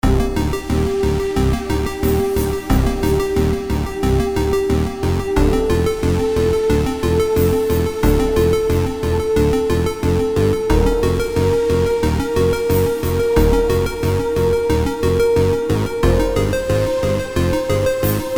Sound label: Deep Dark Waters; Shards of Azuria